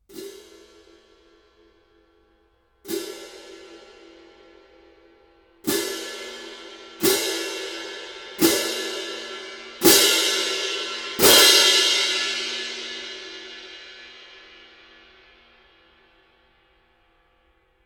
18in zildjian classic orchestral medium light

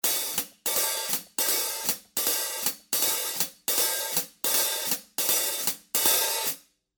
new beat hh open closed pattern

